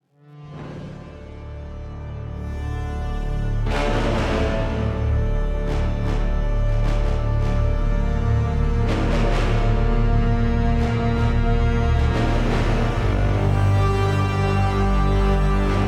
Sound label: Epic Brass Music, brass ensemble; zerog